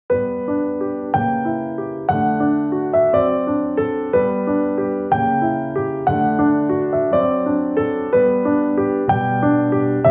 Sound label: Piano; el globo blanco